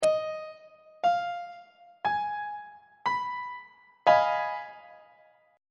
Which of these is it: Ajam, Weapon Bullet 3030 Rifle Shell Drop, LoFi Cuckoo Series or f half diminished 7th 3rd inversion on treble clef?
f half diminished 7th 3rd inversion on treble clef